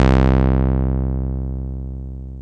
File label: TB303, TB-303 BASS; ROLAND; Rubber Chicken Software Sample Bank